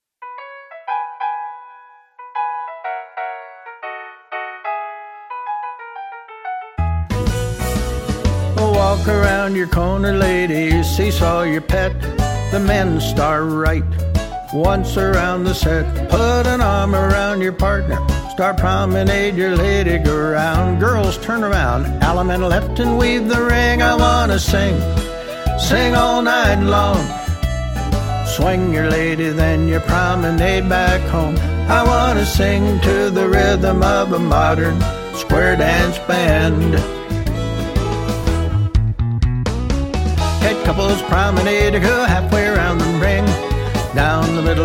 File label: vocal